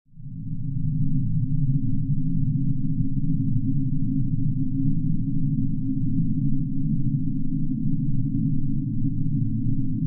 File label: Bed Impending Galact